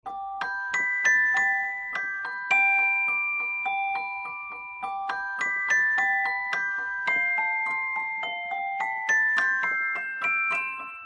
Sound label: Music Box